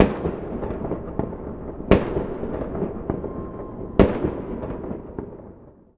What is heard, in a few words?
salute; World of Tanks Blitz; hangar 9 may